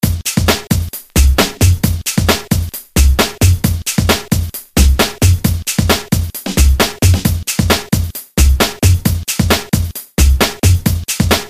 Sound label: Groove; BALLOONrain; Music